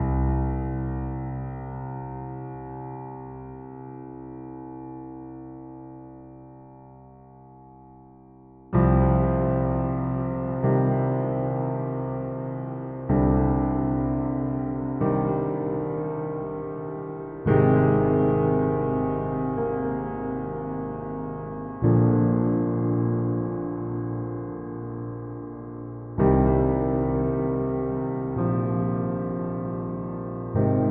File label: piano